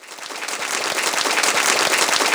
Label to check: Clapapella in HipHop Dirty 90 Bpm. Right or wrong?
Wrong